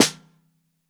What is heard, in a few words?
SN Bottom, Snare